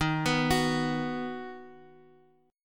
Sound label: Eb Minor Sharp 5th, Guitar, Open F; Ebm#5 arpeggiated; x,10,11,13,x,x; JGuitar